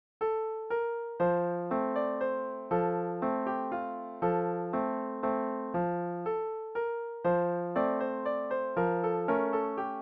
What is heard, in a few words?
piano